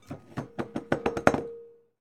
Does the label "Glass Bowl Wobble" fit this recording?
Yes